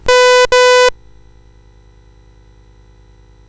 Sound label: BASIC sid CSG8580R5